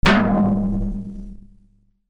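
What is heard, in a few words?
percussion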